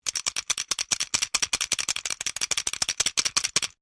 Clacker wooden long, simple wooden persussion toys; Musical